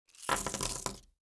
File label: coins to table